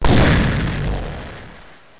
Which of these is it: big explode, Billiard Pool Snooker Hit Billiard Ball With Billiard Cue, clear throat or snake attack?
big explode